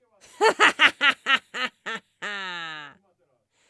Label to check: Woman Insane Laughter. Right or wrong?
Right